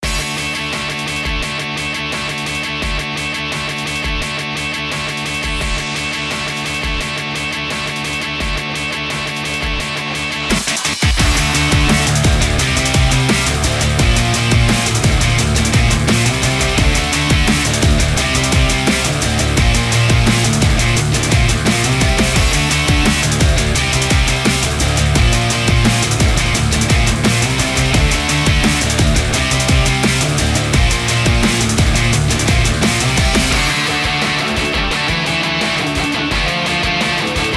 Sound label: Melodic Drumstep Rock; Road Redemption